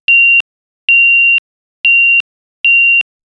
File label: money transfer sound